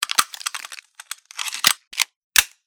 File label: pmm load, weapon